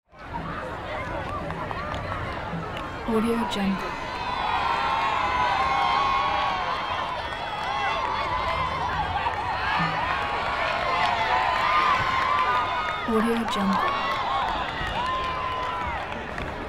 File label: crowd reactions constant sidelines; prosoundfx